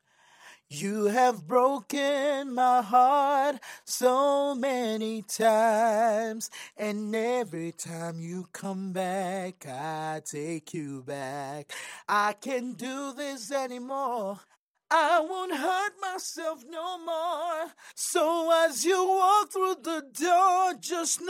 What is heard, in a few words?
acapella, pop rnb 83 EM